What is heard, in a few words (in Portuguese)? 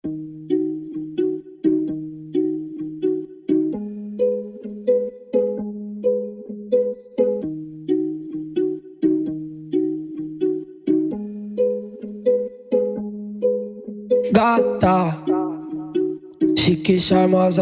esp de cavalgada